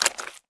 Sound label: step